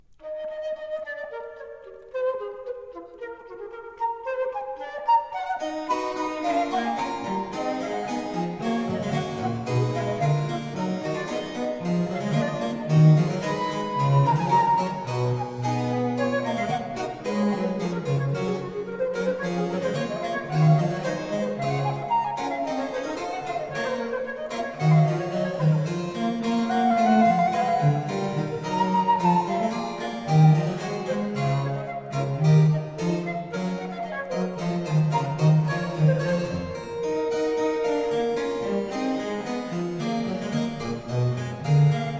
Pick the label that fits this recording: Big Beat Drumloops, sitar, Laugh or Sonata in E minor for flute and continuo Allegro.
Sonata in E minor for flute and continuo Allegro